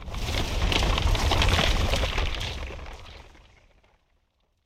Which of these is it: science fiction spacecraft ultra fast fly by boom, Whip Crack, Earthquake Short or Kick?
Earthquake Short